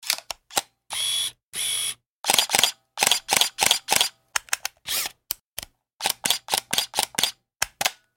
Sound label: Camera Canon T2i; Big Room Sound